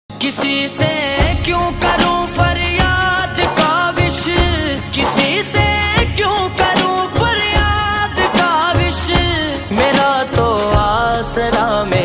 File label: islamic ringtone